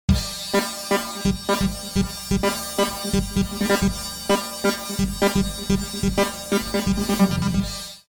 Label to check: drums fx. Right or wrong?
Right